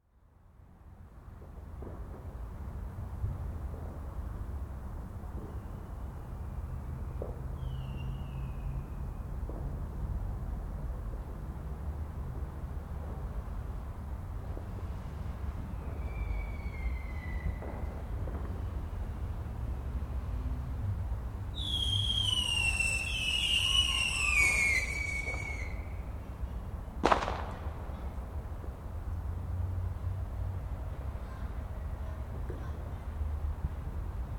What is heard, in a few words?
Firework Night, atmosphere in suburban England with some traffic; Firework Sound Effects; BBC